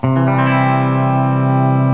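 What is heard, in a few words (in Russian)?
гитара аккорд до мажор C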